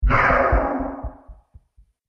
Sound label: Scifi Weapon Shot, laser gun